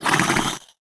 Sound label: Sphinx Scream; Playable Tutorial Level